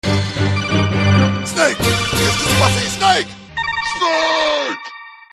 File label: Gameover slow; MGS